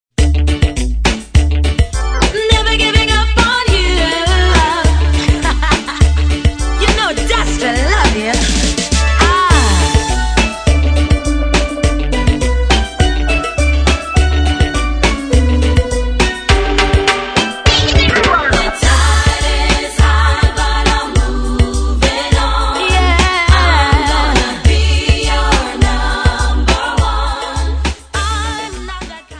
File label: steel dub